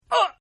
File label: Grunt; Partners In Rhyme